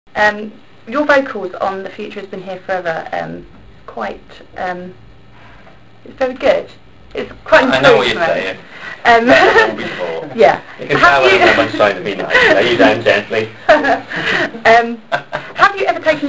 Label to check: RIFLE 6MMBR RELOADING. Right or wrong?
Wrong